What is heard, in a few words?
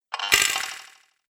Droid Digital Part, robot; Robot Sound Effects